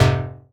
stab lizard, stabs

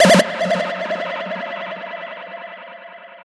ragga

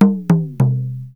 INDIAN DR G6; Spectrasonics; Heart of Asia; INDIAN SET

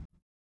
collision; Spectrasonics; Metamorphosis

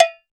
ChaCha Cowbell; Roland BK-5; Percussion

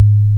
MC SUB, Hip Hop; E-MU Formula 4000 Series Vol. 1; Hip Hop Nation; Big Bottoms

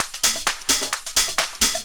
drt 130 addon, DRUMLOOPS, LOOP DANCE

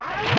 scratch; Roland MC-909

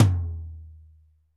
tom, Pop Country; Northstar; Drumscapes Roland

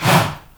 HA, SHOUT, Heart of Africa; Spectrasonics; Heart of Africa 1; CH. SHOUT1

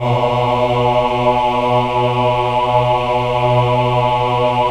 VIB B2, VIBRATO, Voices; Spectrasonics

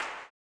CLAP, Blues Soul; Spectrasonics Vocal Planet

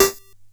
Bent Perc

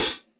Mega Drums, E-MU Pro-Cussion; drum machine

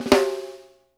RUFF, SNARES; Sampleheads - New York City Drumworks VOL-1; SW SNARES

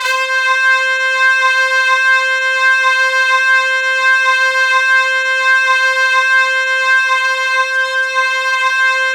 C4, Synth Line; 90 s Sample CDs; NiceBeats; SynthLine VOL-24

